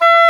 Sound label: Oboe D'amore; Roland L-CDX-03 Disk 1; Oboe 4-8